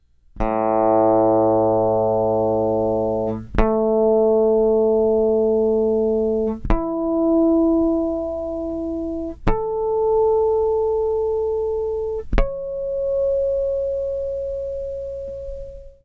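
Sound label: guitar harmonics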